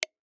Jar Response

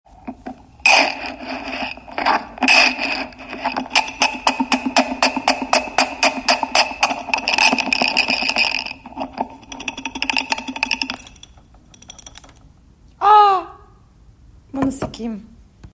Lentil jar socks

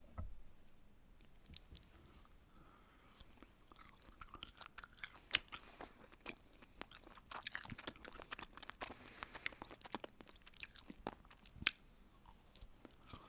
Chewing

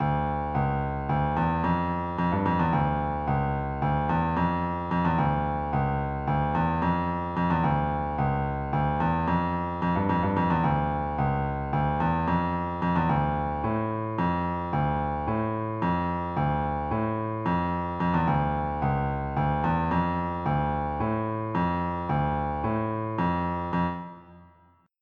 Fugue

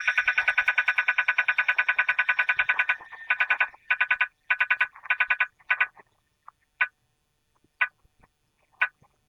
traffic light

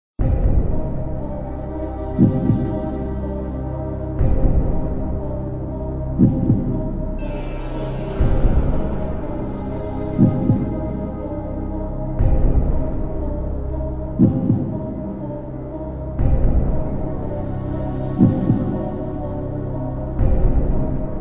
Milionaire suspense

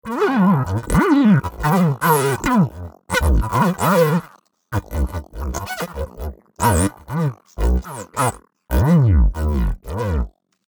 speech to midi test

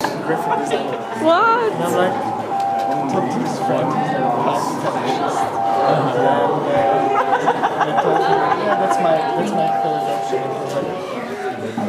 Room noise

